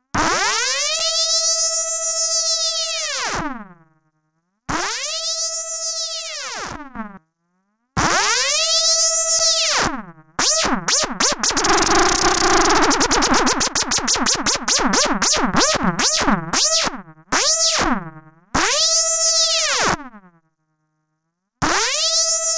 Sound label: Freq Shift